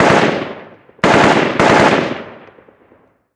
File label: gun ak47 med; modern warfare 2; amb elements